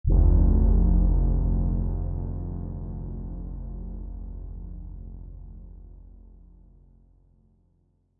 Under The Surface, Mysterious space presence sound; good for horror or scifi atmospherics; Lynne Music